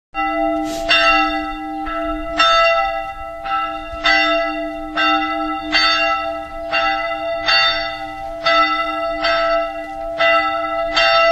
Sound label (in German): Gelaeut; Ottleben